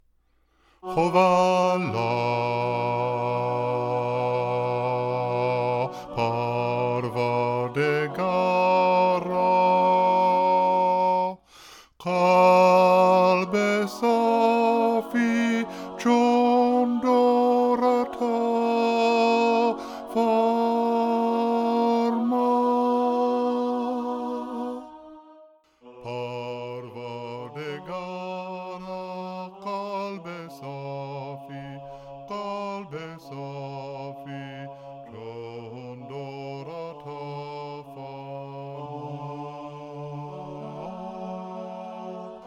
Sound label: bass, choral music